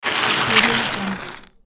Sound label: bicycle skid on forest road